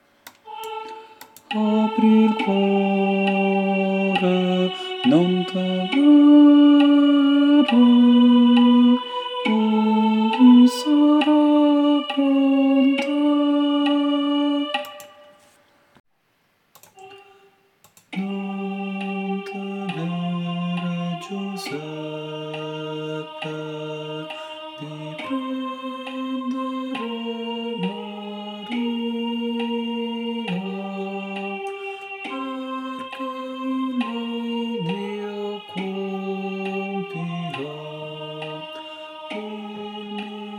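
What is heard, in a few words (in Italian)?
Non temere soprano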